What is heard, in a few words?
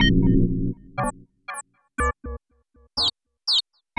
Tape Arp